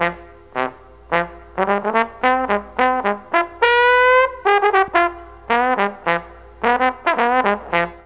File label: A#3 BONE, Mellotron, TRMBN; Mike Pinder - Mellotron Archive CD; DIXIE+TRMBN